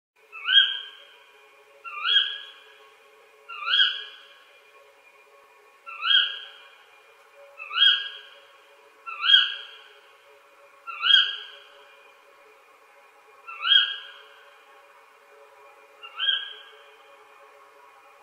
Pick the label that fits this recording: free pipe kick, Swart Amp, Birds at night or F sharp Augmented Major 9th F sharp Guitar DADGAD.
Birds at night